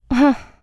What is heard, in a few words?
laugh; Eternal Return; Katja; ENG